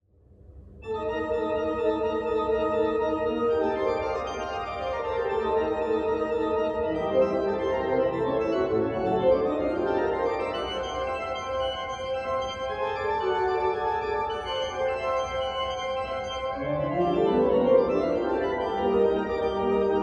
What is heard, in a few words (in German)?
kleine Orgel; Severin